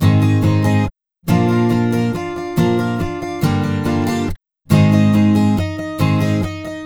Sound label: Acoustic Pop Guitar 140BPM Cmin; Vengeance - Essential Clubsounds Vol.4